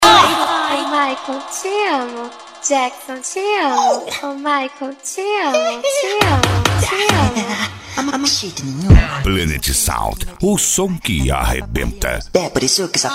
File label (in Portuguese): funk ritmado; cuiaba mt